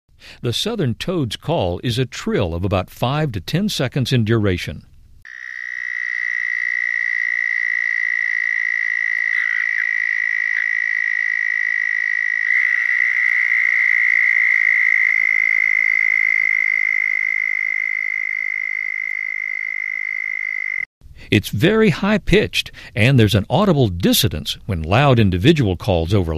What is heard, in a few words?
southern toad